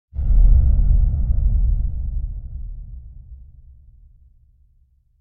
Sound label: Impact Underground Distant Muted Hit; Hollywood Edge; sound designer's toolkit v2